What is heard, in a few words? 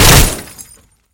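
broken glass tumbler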